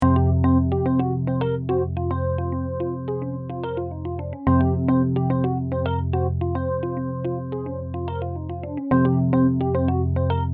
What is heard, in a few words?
ringtone